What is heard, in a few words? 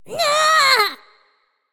ouch; Smite; ymir v2 skin19